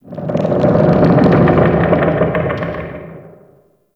Settlement Low Rock Creak; Ambience; Warframe; Grineer Settlement

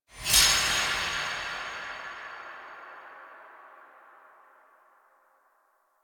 Tutorial UI; Duviri; Undercroft

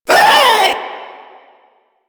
Proto Infested vocal short attack; Warframe; InfestedNinetyNine

